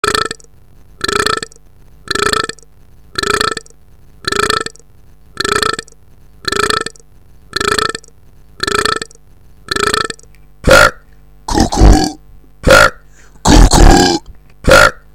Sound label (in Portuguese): Sequência de Arroto